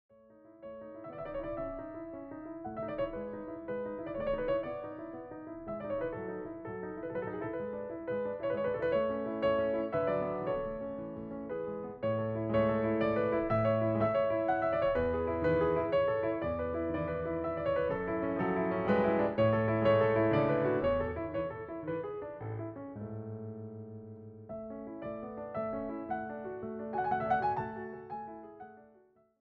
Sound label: Piano Sonata in A Major, Tema (Andante grazioso); Piano Sonata No. 11; K. 331 Alla Turca; mozart